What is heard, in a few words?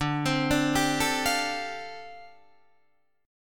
D Minor 11th D down Guitar Open D